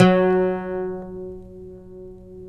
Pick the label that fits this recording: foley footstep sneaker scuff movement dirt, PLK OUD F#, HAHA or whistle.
PLK OUD F#